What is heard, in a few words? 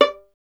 STR VLN, Solo Instruments; Miroslav Vitous